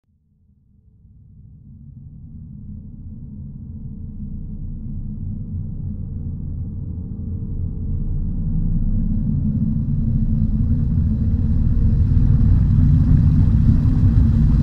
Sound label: Submarine sails underwater